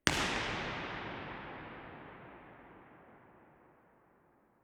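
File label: minster omni, impulse response, York Minster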